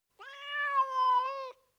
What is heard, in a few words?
Tom Cat, Individual Meows; Cats; BBC Sound Effects Library